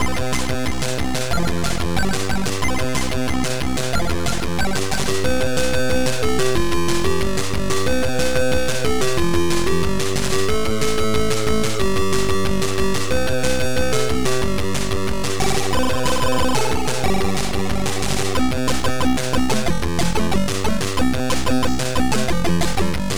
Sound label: Rythm